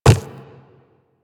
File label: Inf Quadruped Medium Walk Default, Footsteps; Warframe; Infested